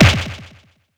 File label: Smash; SE Ing Other Rule#18 (SE Kati00 Smash)